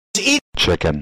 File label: eat chicken